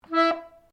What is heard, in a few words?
Piano accordian E4 short, Old Piano accordian; Pianos; Musical